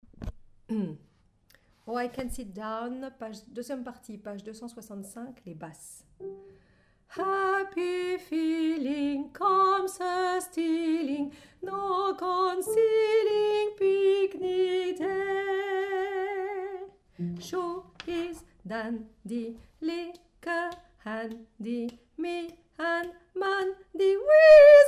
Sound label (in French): Basse